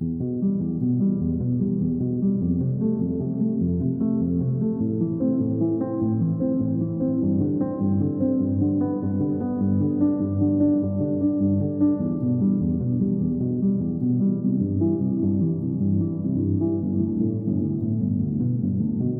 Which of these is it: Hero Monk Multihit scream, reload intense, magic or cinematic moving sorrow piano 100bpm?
cinematic moving sorrow piano 100bpm